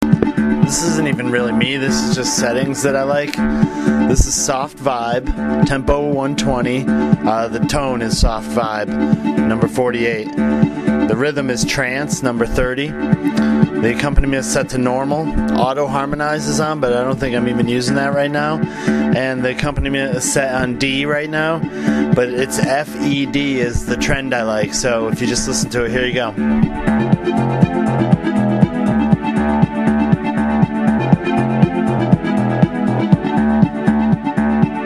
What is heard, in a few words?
keyboard cool settings soft vibe and trance F E D